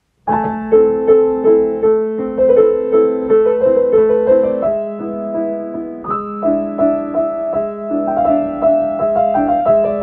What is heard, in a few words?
Allegro Burlesco classical short